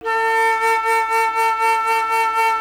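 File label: flute, alto flute; Miroslav Vitous; Solo Instruments VOL-2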